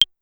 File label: Claves, Pitched Up, Clavia DDrum; Clavia DDrum-AT